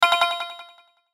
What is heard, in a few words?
Chime Ring Delay; Chimes; Blastwave